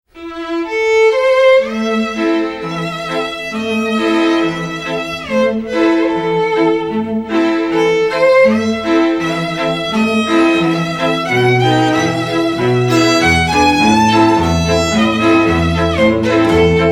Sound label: Classical Trio